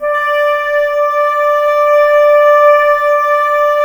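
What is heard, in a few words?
clarinet; Miroslav Vitous; Woodwind Brass Ensemble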